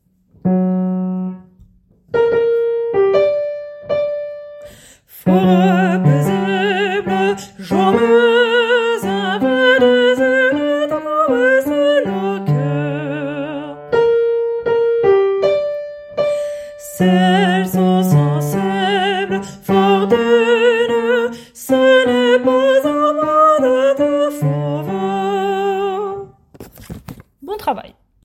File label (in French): partie basses; Les indes rameau